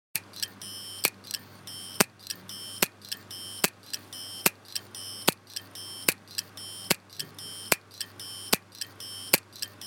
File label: HDD